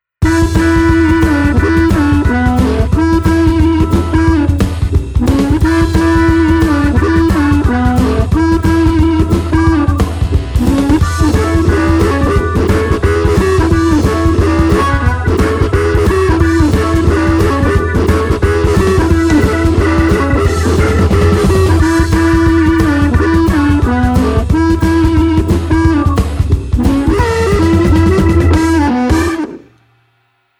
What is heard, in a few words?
Hot Blues; RP250; Shure